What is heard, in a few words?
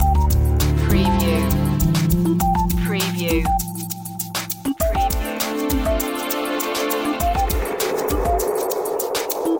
chilled tones loop